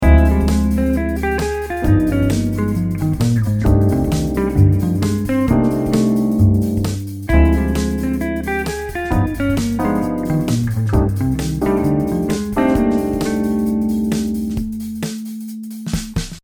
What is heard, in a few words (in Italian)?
solo base